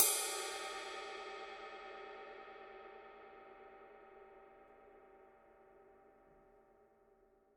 signature dark energy 20 dark energy ride mark ii body; Poids: moyen; paiste